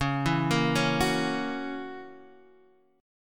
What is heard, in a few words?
F sharp 7th, guitar